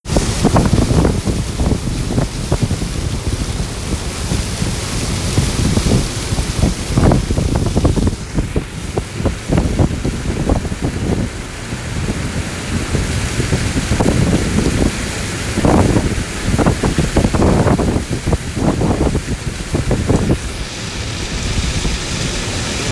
Water flows off the cliffline at Cape Paterson First Surf